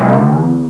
Kettle Drum; Working Model Program

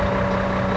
Engine; Working Model Program

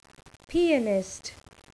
pianist

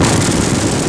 Explosion; Working Model Program

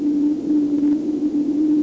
Air; Working Model Program

